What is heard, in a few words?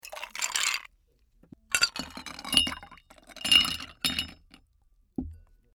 ice